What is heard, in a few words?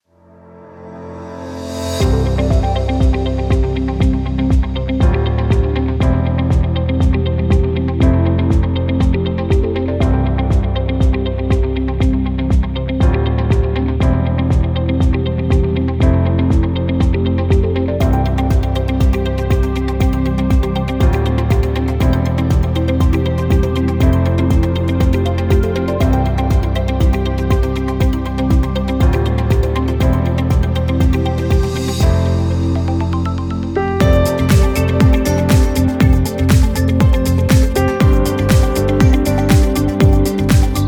Corporate